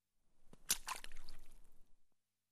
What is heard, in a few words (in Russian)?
melkij kamen upal v vodu